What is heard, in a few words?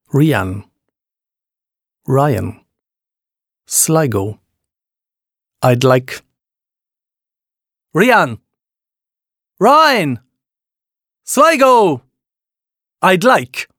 Shout